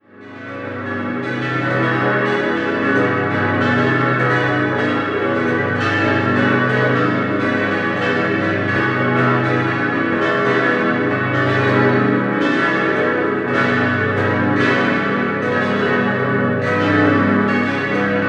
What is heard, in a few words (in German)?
Sarnen, glocken